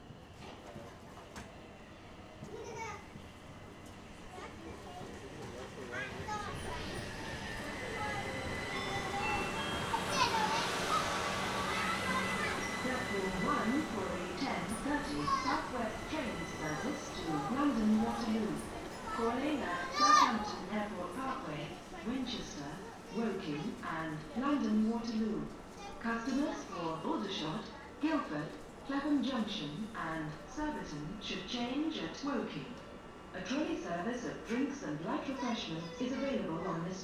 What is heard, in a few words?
train arrival